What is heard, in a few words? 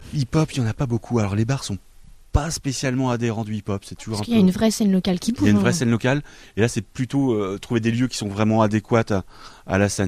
hip hop; barsnrennes2011